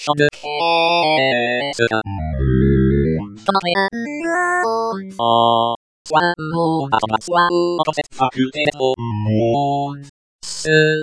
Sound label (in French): ouverture chant